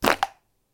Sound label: crash PET